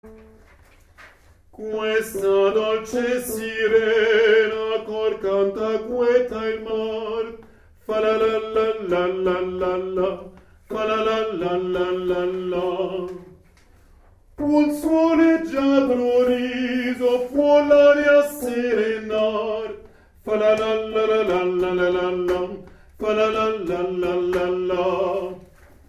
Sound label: SIRENA Tenors